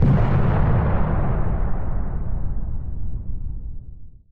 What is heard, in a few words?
explosion; space game